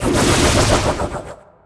Weapons Roll

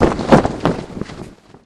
Flag

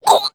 Hit

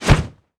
Basic Swish M

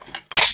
put in

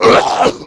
DIE

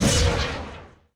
Impact

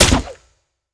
ARROW

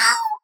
Die

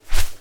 Flap

